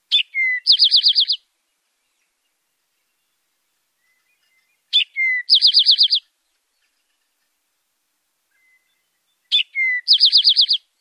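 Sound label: Eastern Towhee classic song drink your tea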